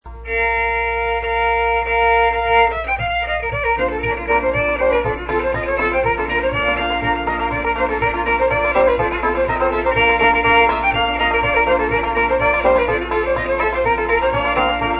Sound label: Hornpipe